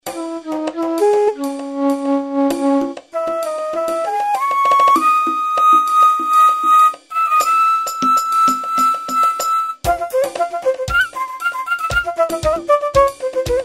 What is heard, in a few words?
flute indian